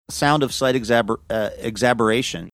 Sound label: sound of slight exaberation